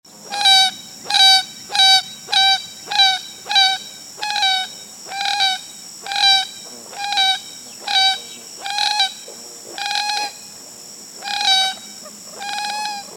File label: common gallinule; emiquon virtual tour; birdwatching; illinois